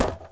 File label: wood collision